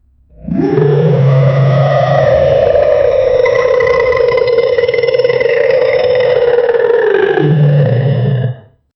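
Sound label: demonic carnivorous dinosaur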